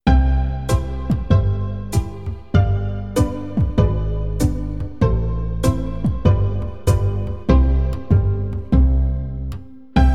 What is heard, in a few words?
Harmonica to play along, blues harmonica; Little Hans